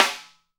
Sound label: SD, Real Kit; 90 s Sample CDs; Sound & Vision - Gigapack I CD 1 (Roland); Real-Kit m14